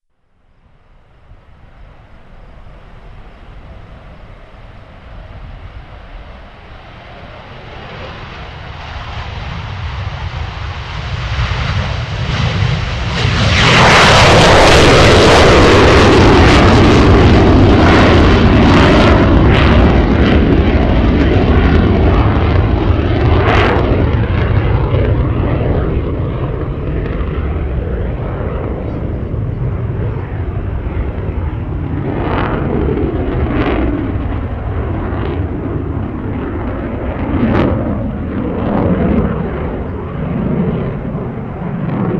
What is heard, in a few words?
F18 Take Off